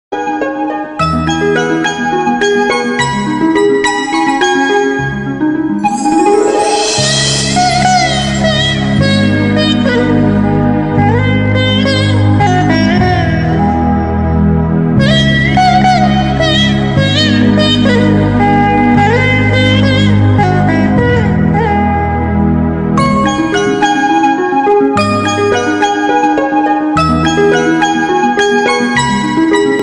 bgm music instrumental music telugu movies bgm music; sontham